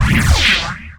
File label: teleport